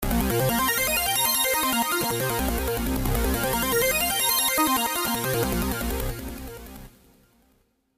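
LA Saw SUB, Then it is made into an octave unison with the Sub-Oscillator, and the phrase becomes more lively.; V-Synth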